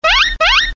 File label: squeak